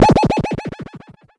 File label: nuke; VMMenu; pitrex